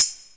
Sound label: Tamborine, Misc Percussion